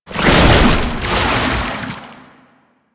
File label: Babau Stagger, Foley; Proto Infested